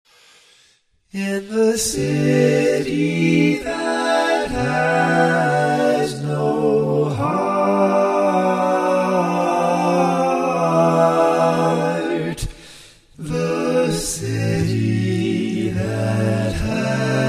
All Parts, barbershop tags

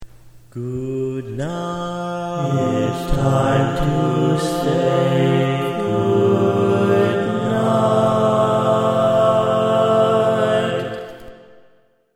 Tenor, Barbershop Tags

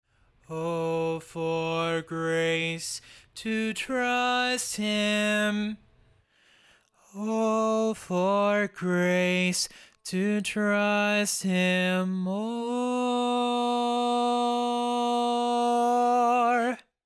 Bari, barbershop

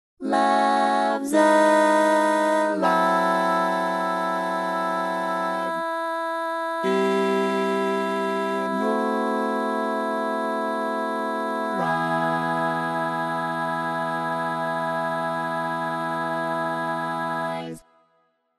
Lead, barbershop tags

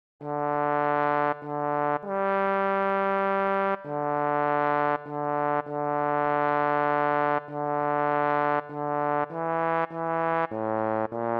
Bass, barbershop tags

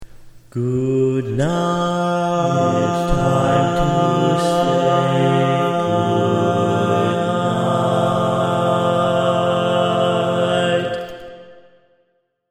Lead, barbershop tags; Arranger / Josh Surver / Year: 2009

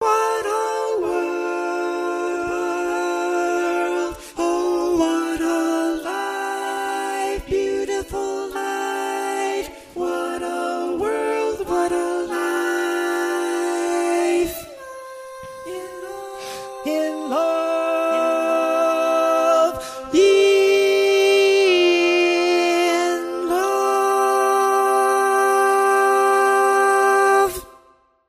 Bari, barbershop tags

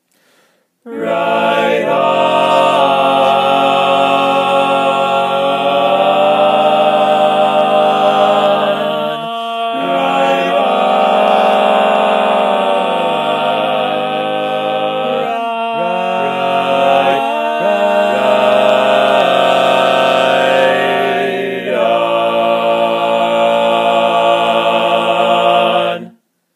All Parts, barbershop tags

